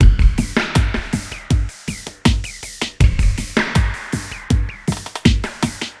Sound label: DRUM LOOP ambient 160